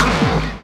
BD Distortion, kicks; VEC1

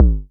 Synthe Drum, Daytone Drum-Synthe-RDS

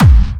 BD Clubby, kicks; VEC1 Clubby

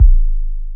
HOUSE KICK, Deep Hse Kicks; CF DeepHse Kicks

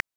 Rim, Acetone Rhythm-Master

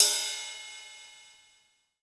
Kawai ACR-20 HH RIDE

